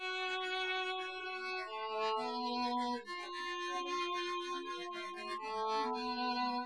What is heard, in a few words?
Castanets Violin Applause